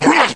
pain; heavy

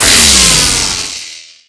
shock

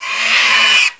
alien pain

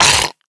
spider hit; cstrike

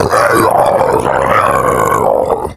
Crawler Infected; zombie plague

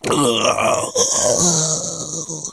zombie die; zombie plague

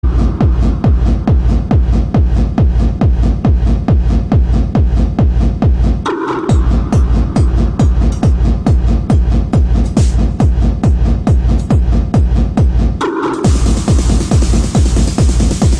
hard techno

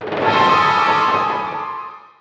Tongue Tied Hit; zombie plague

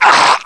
pain; hunter

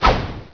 Ensnare Missile; warcraft3

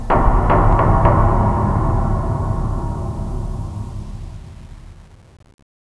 metal

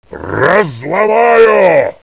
zombie die

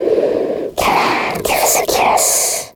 Stalker Infected, zombie; zombie plague

